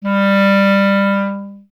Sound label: clarinet G, Solo Instruments; Miroslav Vitous - Solo Instruments VOL-1; 90 s Sample CDs